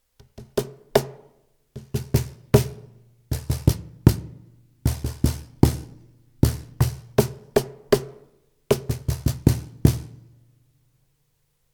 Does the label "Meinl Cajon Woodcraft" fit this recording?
Yes